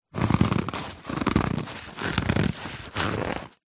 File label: foley cardboard squeezes creaks; zapsplat